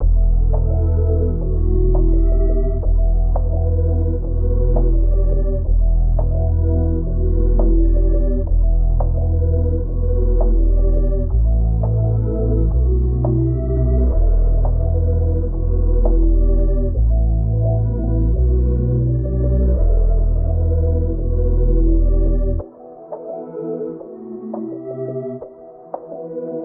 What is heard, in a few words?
170 BPM E Min, Melody; Cymatics; 2022 Melody Collection Platinum Expansion